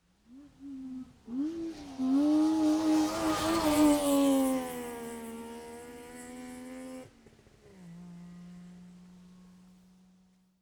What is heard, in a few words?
car saab 92 exterior pass right to left